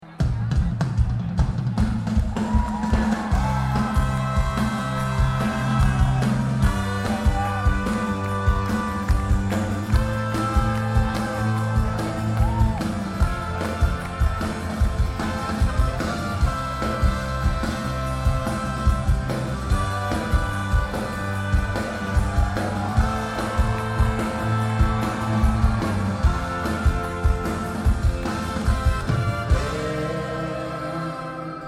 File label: Ring The Bells